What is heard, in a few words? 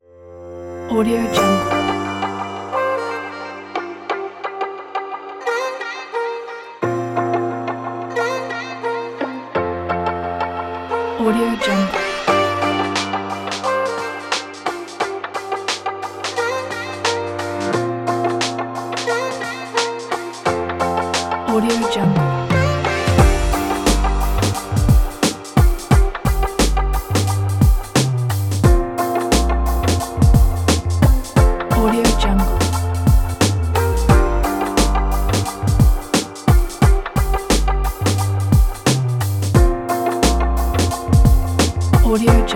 easy groovy hiphop